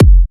Electro Kicks

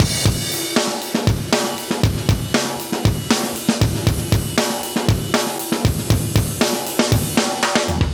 Live Breaks, 118

